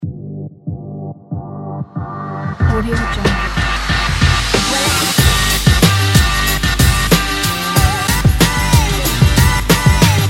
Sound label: the hip hop